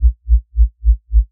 reason bass; neuro; Sinistarr

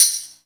TAM; E-MU Formula 4000 Series Vol. 2; OrbitPercMaster

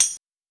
Tambourine, Linn-9000; Drums

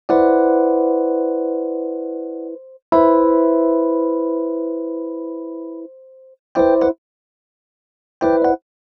Rhodes; Sonic Foundry; Sony Creative Software; Cinematic Funk Urban Tone Poems

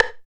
STICK R; East West - Phat & Phunky

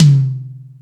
Rock N Roll, Drum Machines

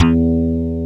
PULL BAS

